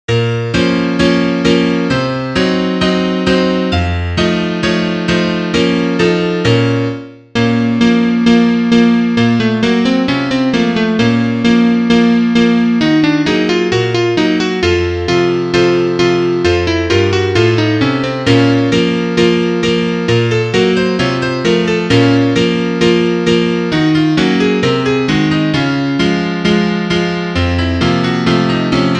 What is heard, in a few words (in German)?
Klavier Solo, trompete; Duett in c - Moll; (1. Stimme)